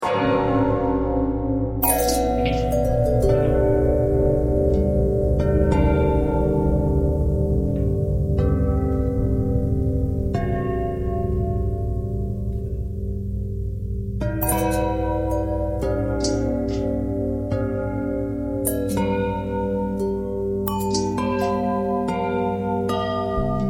long bell bell twist

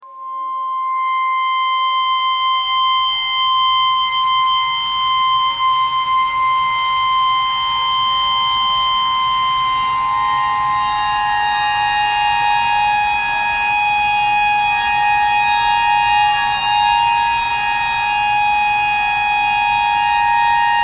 tornado siren clarinet notes e pentatonic

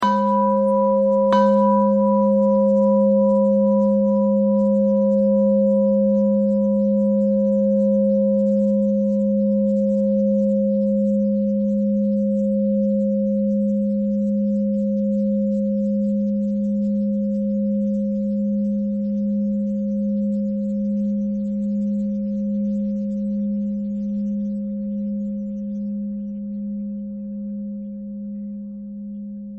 bell thread pentatonic

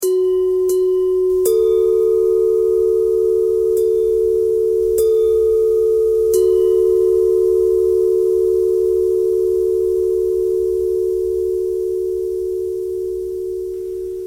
bell thread bend thread

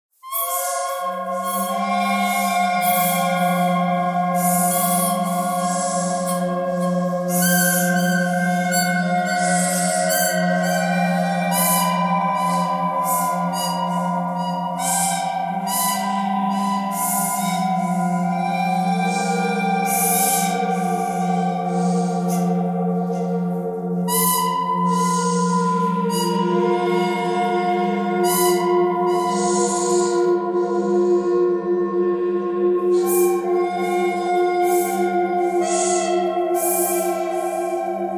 bird canyon thread buzz

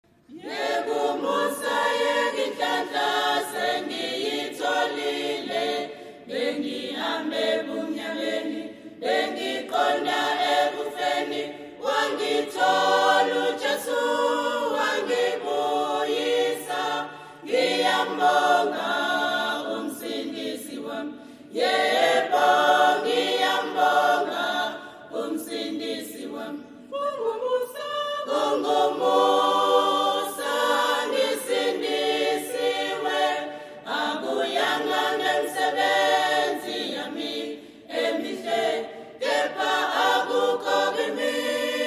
choir

